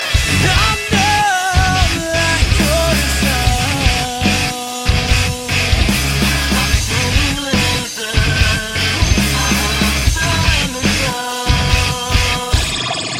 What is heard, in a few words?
Jazz